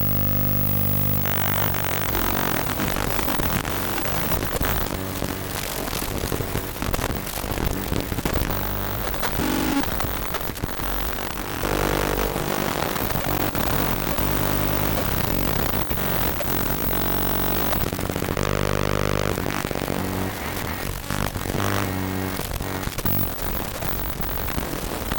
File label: VCR Reversing Damaged Tape; SFX; IRL Recorded